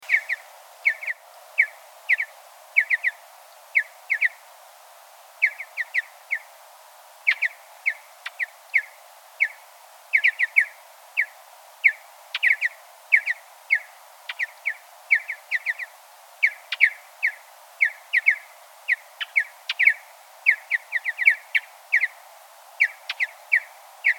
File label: Mountain Bluebird Sialia currucoides